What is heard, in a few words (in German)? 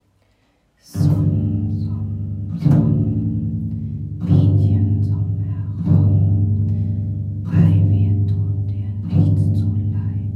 Summ summ summ Bass